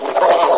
con idle; source engine; hl1; video games

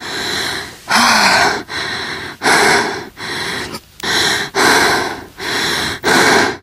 exertion major, teen girl, survivor voice; source engine; left 4 dead 2

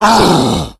hulk pain; Left 4 Dead; Source Engine; tank

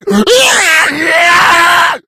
become enraged, infected; Left 4 Dead 2; Source Engine

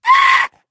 pain, voice; video game; source engine; left 4 dead 2; spitter

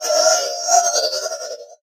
robot synth; Source Engine; Portal 2; robot parts

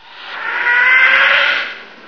attack; source engine; bshift; controller

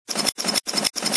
usp silencer off, weapons; Source Engine; cstrike

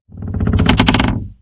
coaster creak; ambient; Left 4 Dead 2; Source Engine

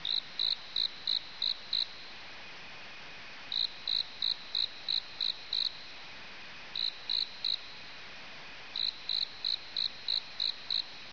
forest cricket; ambience; Source Engine; dod

